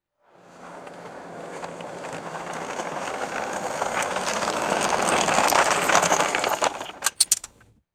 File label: electric golf cart driving up and stopping; sports